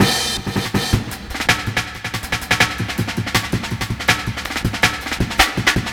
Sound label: Beep Break, Jungle Breaks; Blu Mar Ten - Jungle Jungle - 1989 to 1999 Samplepack